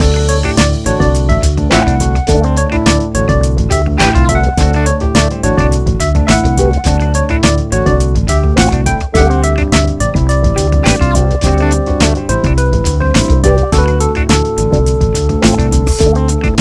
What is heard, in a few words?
BGM Menu; Foto Frenzy Spot the Difference 2009